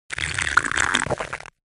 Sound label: slurp